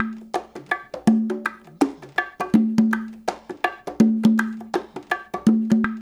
LOOP, CONGAS, Percussion; InVision Interactive; Percussion Slam

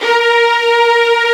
STR STRING, Orchestral Strings

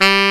TENOR SAX; AKAI S-Series CD-ROM Sound Library VOL-7

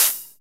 HH HH, DANCE

